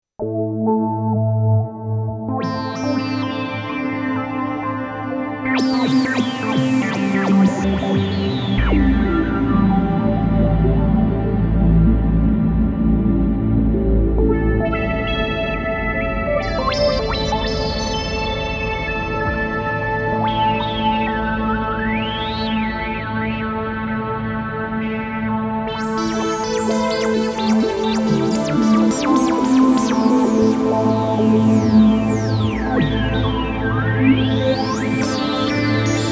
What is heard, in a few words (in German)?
DM12D Pad mit viel Hall